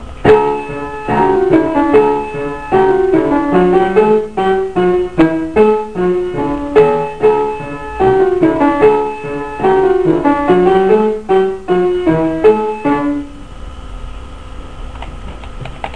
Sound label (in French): Musette